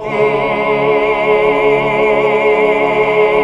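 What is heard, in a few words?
chord 2 5 R; Best Service Gigapack 1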